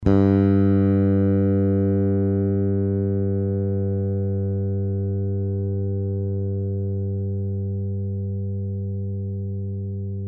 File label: fishbone open String sustain, bass guitars